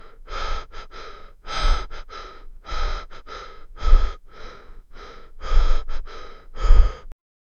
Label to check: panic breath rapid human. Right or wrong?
Right